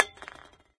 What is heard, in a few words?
metal pop can impact hard; physics; Source Engine; cstrike